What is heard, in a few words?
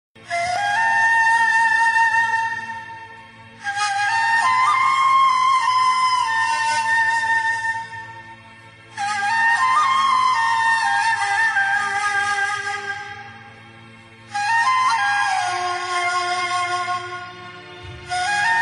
Arabic ringtone